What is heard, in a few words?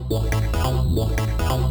TECHNO SYNTH 140; Keys